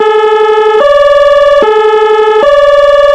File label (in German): notarzt kompressor, sirens